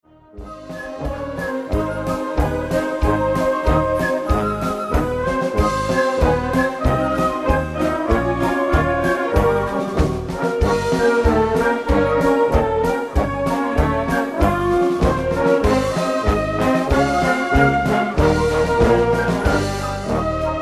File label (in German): Musikalische Weltreise